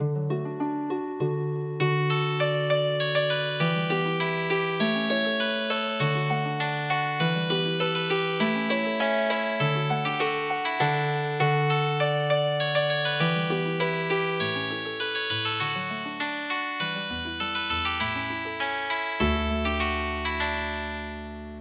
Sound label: Healing Harp